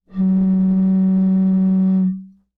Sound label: Cartoon Bottle Blow Bottle; AdobeCC